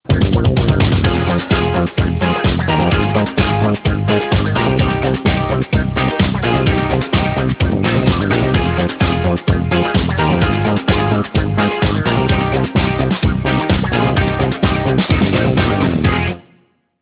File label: ringtone disco, instrumental